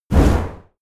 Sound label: push